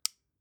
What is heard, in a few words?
flashlight release